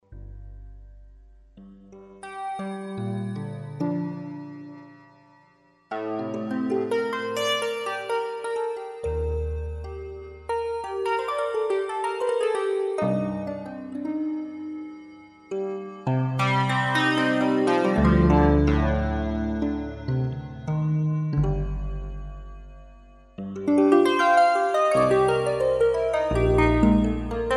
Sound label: Schmidt Goes DX7